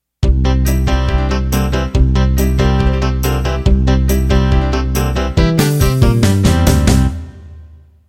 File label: Rock Boogie Intro